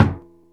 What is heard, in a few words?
surdo M, Percussion; SN-R8-01 Contemporary